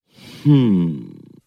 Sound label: "hmm"; Voice; ooh ahh; human